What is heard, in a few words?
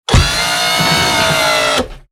Robotic Movement; SoundsCrate